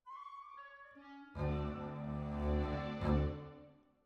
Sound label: Cbasses